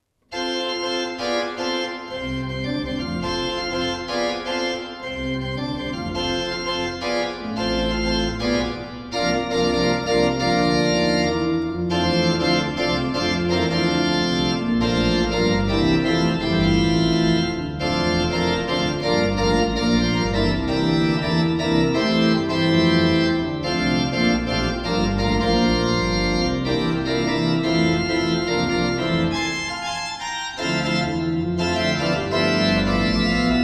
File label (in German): Orgel; Die gueldne Sonne